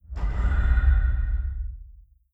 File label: distance dimensional horror tentacle attack; Stellaris; guardians